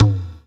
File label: PERC, Dance Mega Drums; Best Service; PERC MIX